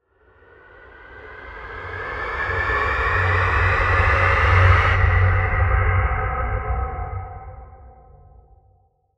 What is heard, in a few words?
Riser